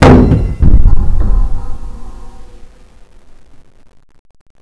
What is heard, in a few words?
door stop